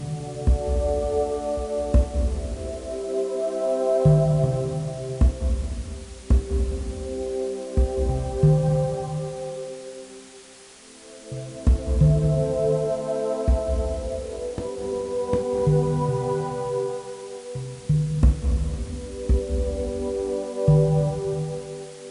Ambiant training; Logic Island; NDS

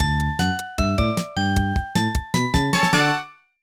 Music Gen Success Good

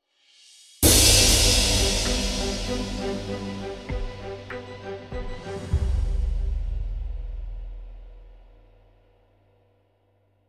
Level Complete

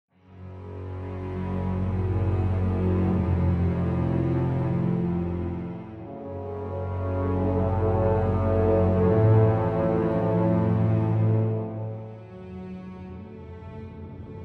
Ambient; Mass Effect; Hulick's

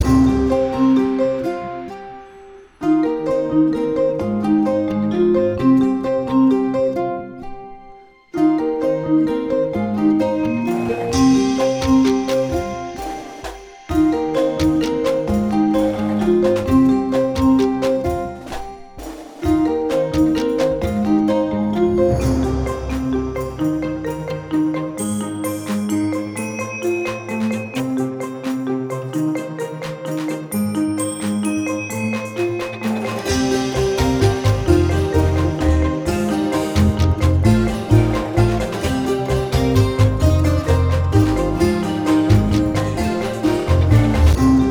Water Elemental Gate; Skylanders Giants

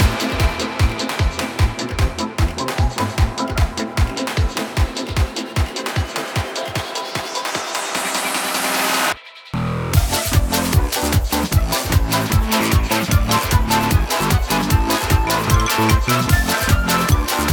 DJ loop; Doodle Jump Space Chase; Mu ship room

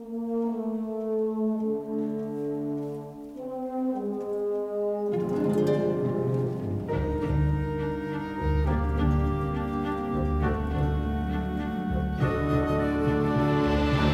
music outside explore

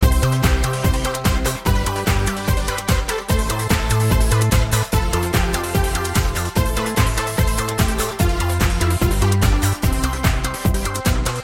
Special Attack Music; Zaptor Special Attack 3; Calling All Mixels; 2014 mobile gamerip

